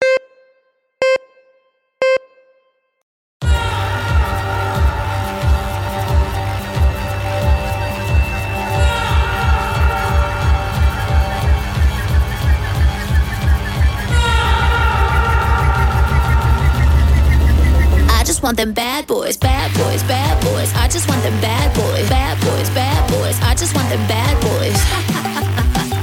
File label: DnB; PygmixStudio